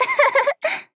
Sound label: Weapon; Assassin; Paladins